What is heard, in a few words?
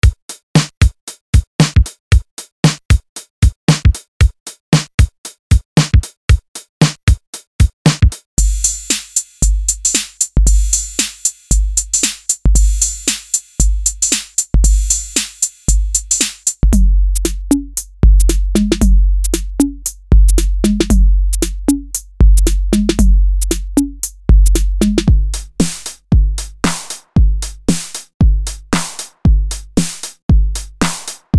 edm drums; hexloops